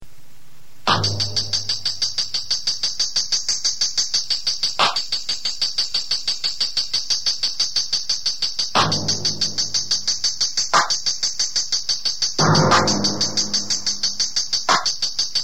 Romantic

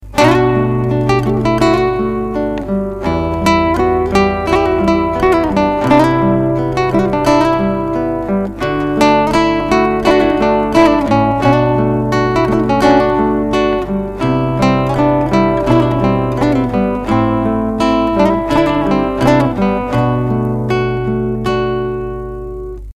GTR

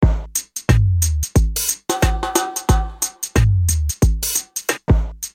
Drum Loop

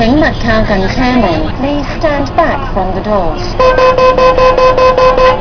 Door closing

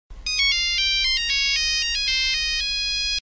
Nokia Tune Beep

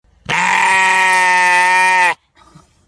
Baaahhhh

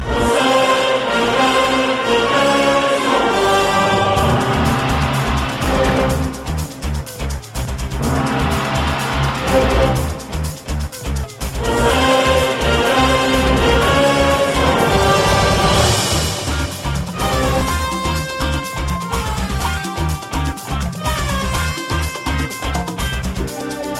Best Beat; Ra1

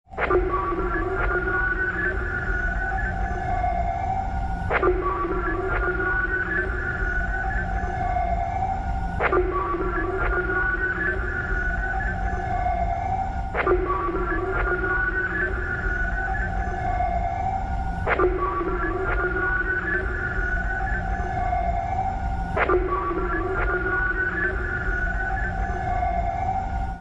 Distress Signal

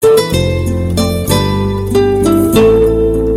Nice Mesg Tone

